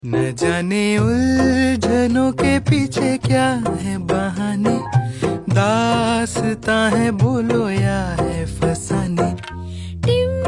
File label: Ringtone